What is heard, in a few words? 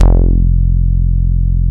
bass